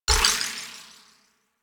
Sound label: UI End Of Match XP Hit; DE; Warframe